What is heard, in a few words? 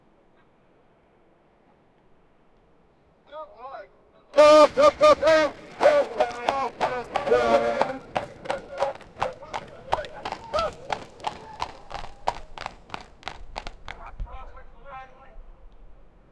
horse racing on a track